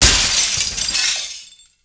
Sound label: hit window; GTLive